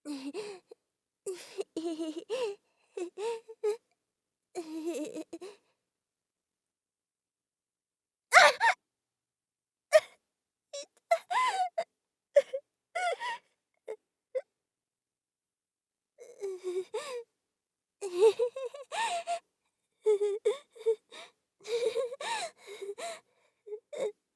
頬擦りしてハタク; 効果音なし

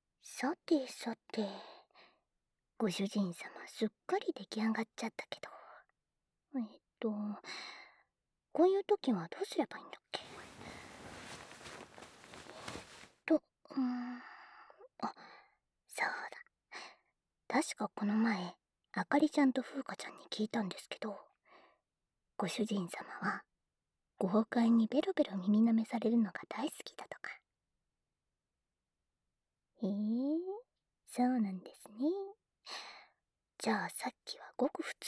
責めの耳なめ(左), ASMR

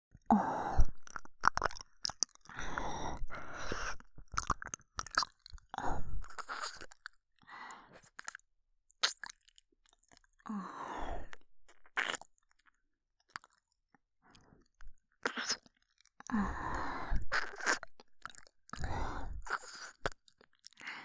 右耳舐め

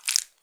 膣外射精

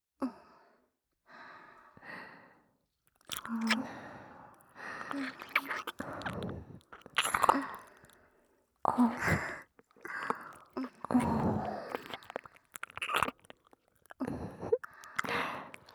キス：両耳舐め; 中央＞ゆいか; みさき＋先生

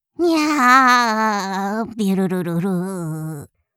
目を回す

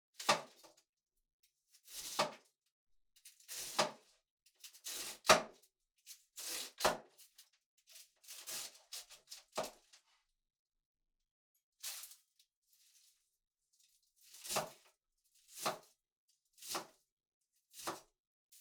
切る キャベツ; 3DIO; 食事に関する音

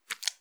くちゅ音単発

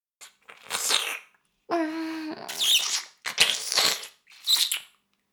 耳舐め音声; 少尉; 牧場戦腺

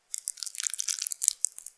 挿入or引き抜かれ終わる直前 普通; 挿入or引き抜く

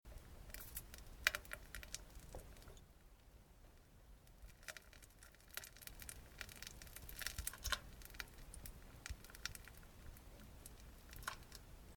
普通うんこ ぷりむちむち音

